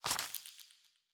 Stickers Frozen On Pull; Sticker Packs

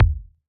Ball Collision Geo Tackleball Med

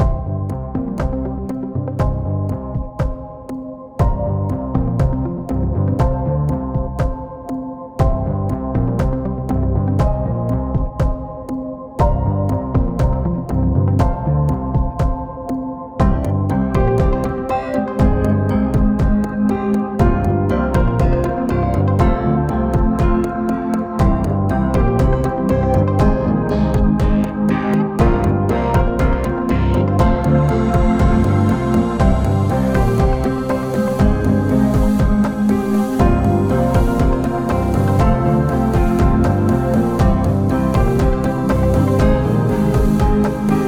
Music Quest Normal

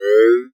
Digital Grainy Power Up

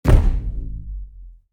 RC Ball Hit; Scraprun; Deathrun

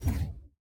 Hand Paddle Release Light

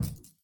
Hand Paddle Attack Extra Light

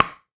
Pickleball Paddle Hit Ball Hard

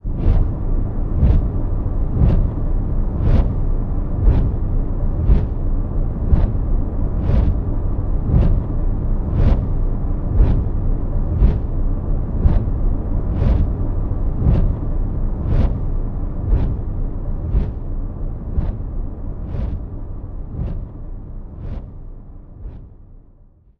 Spinning Arm Slow; Scraprun; Deathrun

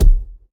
Ball Player Hand Hit Tackleball Hard

